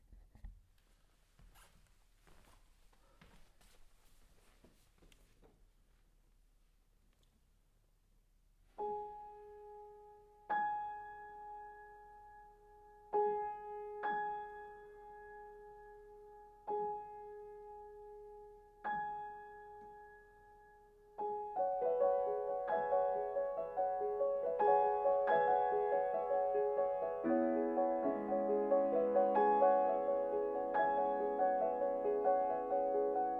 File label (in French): cloches; Musicum